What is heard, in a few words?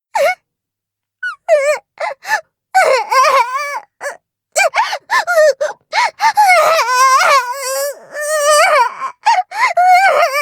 crybaby; rik